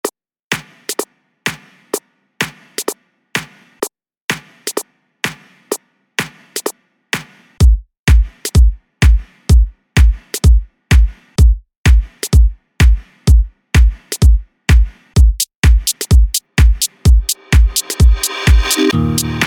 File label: sax mix